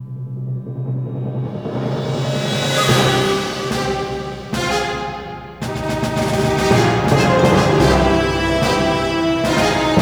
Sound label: Sting; Call of Duty World at War Final Fronts; PS2